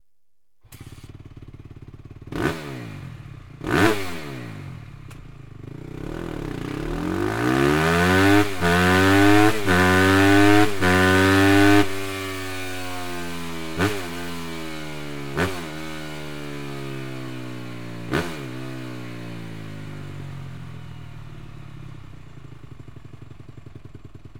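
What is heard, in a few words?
slip on with insert, exhaust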